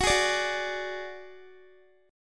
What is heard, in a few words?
Gb Suspended 2nd Sharp 5th, Guitar; strummed